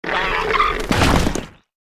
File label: Worm Die